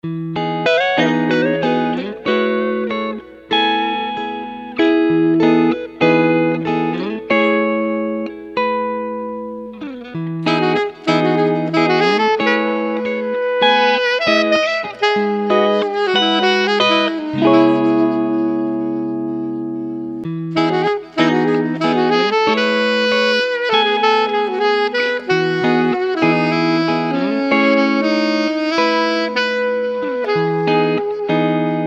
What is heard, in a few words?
Smooth Sax and Guitar